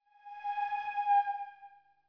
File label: mahjong hint usage; game; Nokia N900